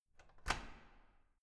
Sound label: door key unlock